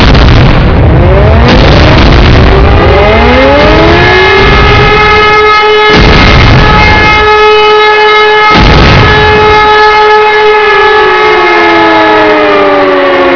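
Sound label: distant explosion; cstrike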